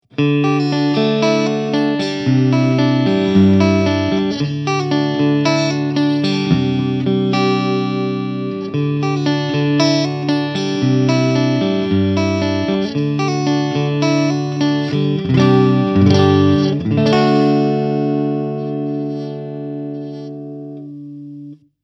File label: Rickenbacker 350V63 Clean Neck Middle Pickup